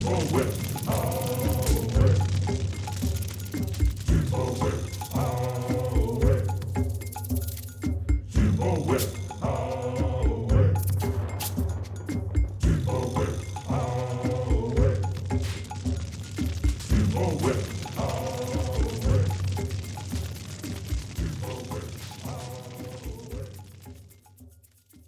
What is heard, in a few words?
Voodoo Chant